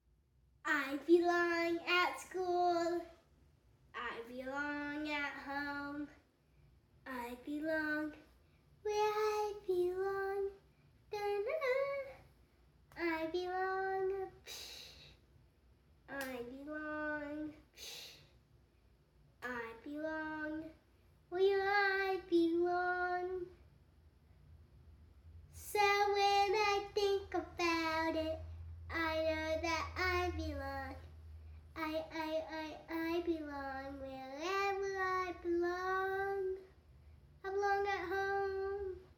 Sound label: Primary Music